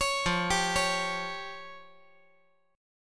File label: F Suspended 2nd Sharp 5th